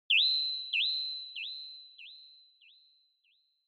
Flash High Delay; Onoma

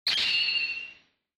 Onoma Flash High Short

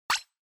Onoma Surprise High

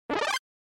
SNES Shooter Item

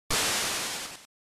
General Noise Single

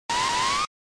SNES RPG Magic

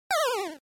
NES General Pitch

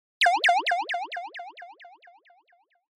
Motion Pop

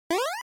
Jump; NES Action

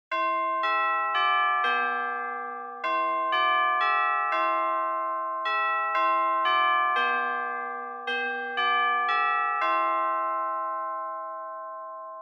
Japanese School Bell Fast Long

